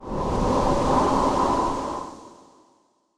desert planet; ambient; Stellaris

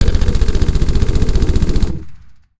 auto cannon fire medium; weapons; Stellaris

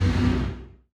distance space whale fire, weapons; Stellaris

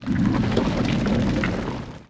barren planet; ambient; Stellaris

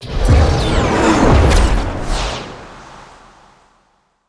reinforce all, ui; Stellaris

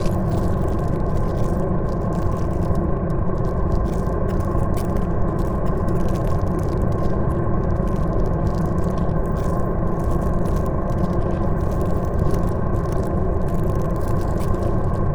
pro ship mauler idle; biogenesis

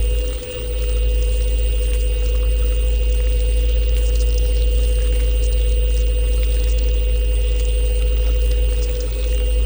ant ship harbinger idle; biogenesis

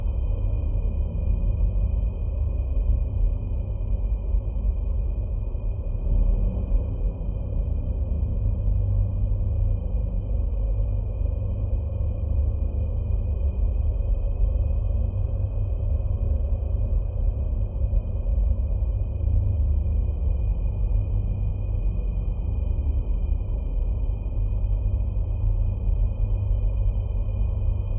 ambient nebula turbulent; System VFX

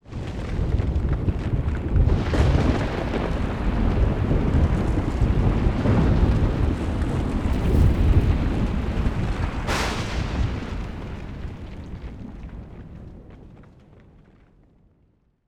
thunderstorm; Stellaris; strange worlds

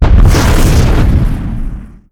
spikespore fire; weapons; Stellaris

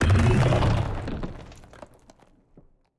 cutholoids hide; Stellaris; grand archive; ships

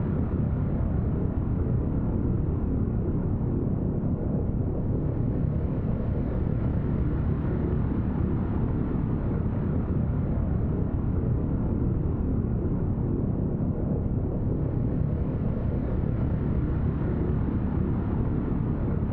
ship colossus idle; Stellaris; machineage

